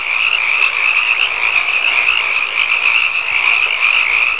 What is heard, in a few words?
crickets; svencoop; hunger; thambs